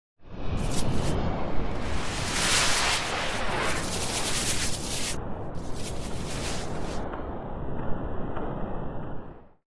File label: Noisy Swirls